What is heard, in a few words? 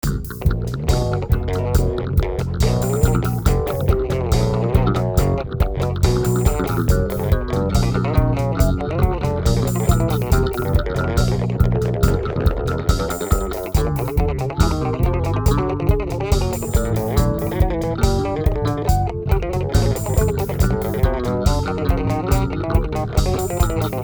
hiphop